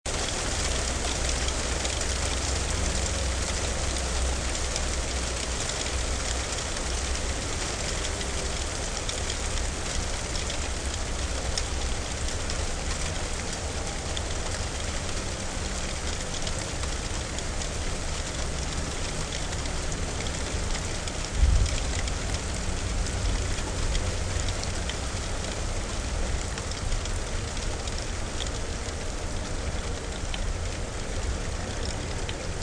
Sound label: Wonderful Thunderstorm and Rain